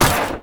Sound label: Metal Ladder Hit; Warframe; Ambience; Nineteen Ninety Nine